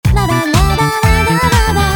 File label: Bass off